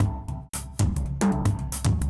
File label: tribal